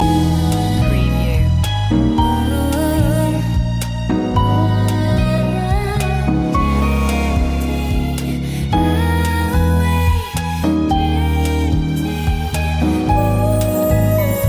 drifting away loop

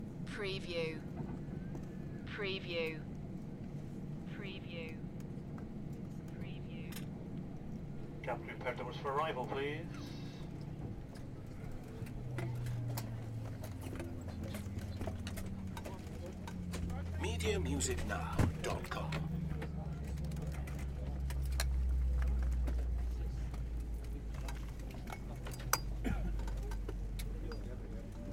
Aeroplane leaving cabin; beanstalk audio

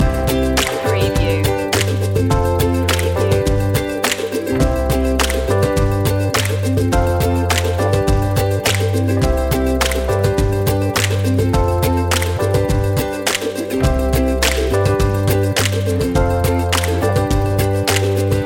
so happy loop

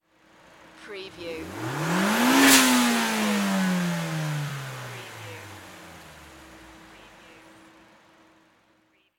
NISSAN SILVIA ENGINE SINGLE REV

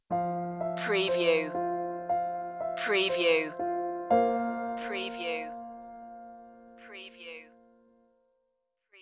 soft surrender stinger

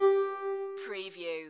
musical

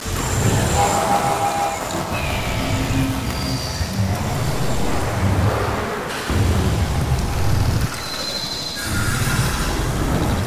turbulent sci-fi background; loop

